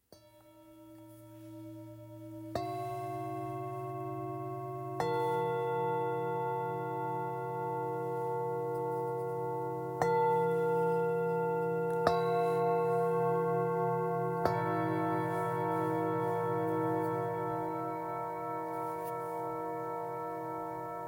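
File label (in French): Set, bols chantants tibétains; synergie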